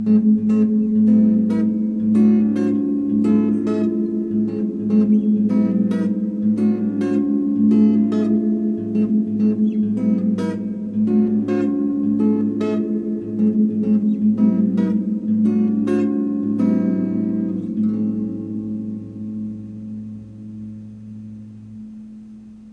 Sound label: diy spring reverb driver amp first test